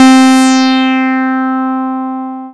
Dance Floor Bass, Multisample Basses

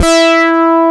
AN SYN, SYN & LEAD; SAMPLE WAVE MIXING 2

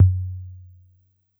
Analog Tom, Yamaha PSR-E323

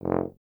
BRS TUBA, Solo Instruments

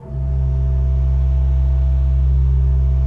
C1, Cathedral Organ; ILIO; Propeller Islands Cathedral Organ

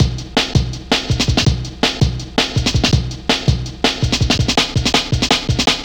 164 Insects, breaks; m8 breaks

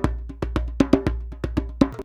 119 DJEMBE, Modern Percussion Loops

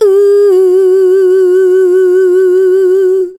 vox, Techno Trance; Ueberschall

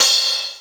HH, Dance Mega Drums; Best Service; HH-HIP

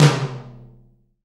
TOM TOM, Roland

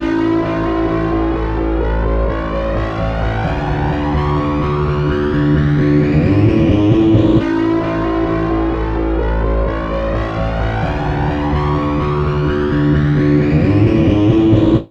Bass Guitar Loops

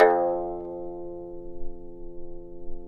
tar; Roland - LA Composer vol. 3

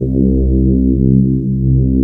CMI ORGAN; Wizoo - Powered DX

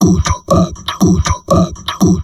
TALK, Drum Loops Crazy Processed

